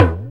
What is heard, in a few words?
6-8 Talking Drum, Perc Loops